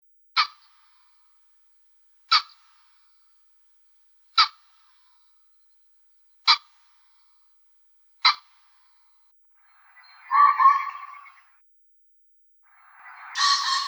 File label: Phasianus colchicus